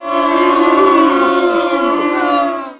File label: boo; WhiteOut